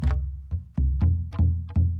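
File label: Calm Far, music; from dust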